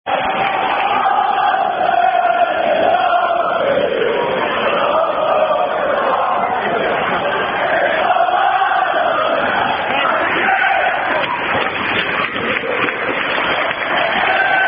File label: Garbled Chant; Unknown (Garbled) Chant